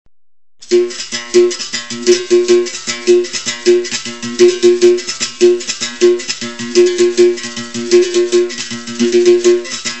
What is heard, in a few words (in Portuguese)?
Toque de samba de roda, capoeira